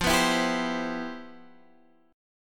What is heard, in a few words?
C Major 7th F; strummed; JGuitar